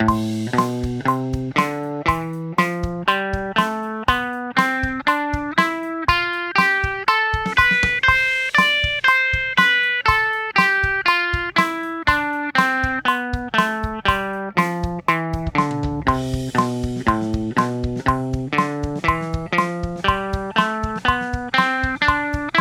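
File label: A minor #1 Aeolian 120 bpm quarter, guitar; a minor diatonics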